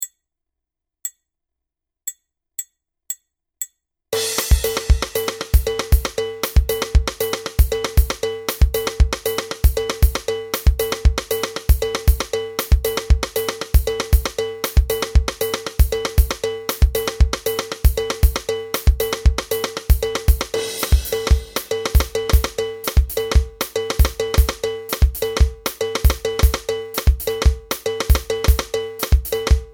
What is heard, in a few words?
drums